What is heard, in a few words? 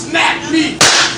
twisted tea smack